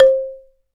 BALA, Balaphone; Roland LCDP11 Africa VOL-1; Bala & Xylo

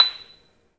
MARM, 3D MARIMBA; 3D Sound; 3D Keys VOL-1

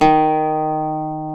PLK D#2 Bouzouki, Greek; NorthStar - Global Instruments VOL-1

